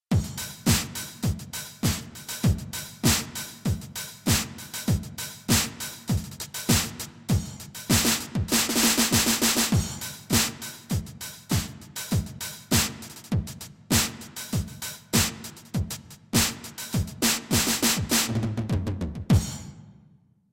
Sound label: 909 Drum Set; sibelius sounds